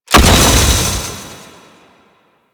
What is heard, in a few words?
Movement Jump Land; Enemies; Warframe; Kela De Thaym